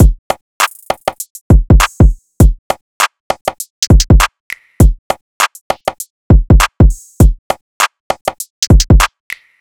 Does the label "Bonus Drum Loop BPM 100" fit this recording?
Yes